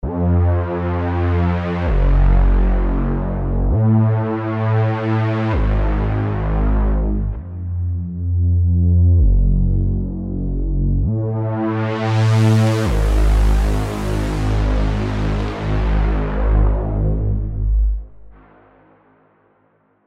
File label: Reese, SERUM; Archangel